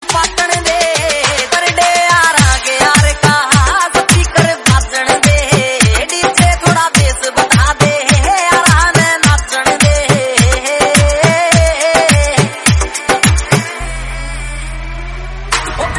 Haryanvi Mashup

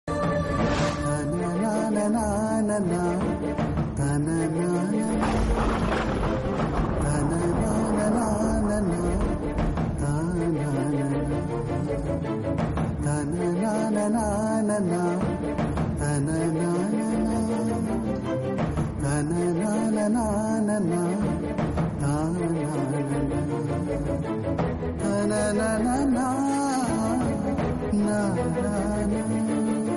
Serial BGM; Sembaruthi

